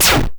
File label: exp shortest hard, Explosions